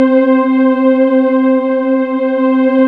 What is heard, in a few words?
PAD, Synth Pads; SYN-PADs; Synth-Pads 5